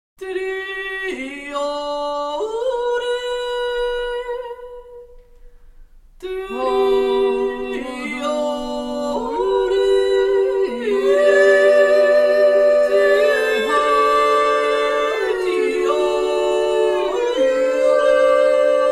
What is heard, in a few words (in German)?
Jodler, 3er